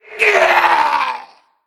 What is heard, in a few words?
Male Skeleton Death